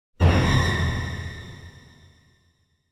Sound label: Symphonic Hit Strings And Drum Murder Finale; Hollywood Edge; sound designer's toolkit v2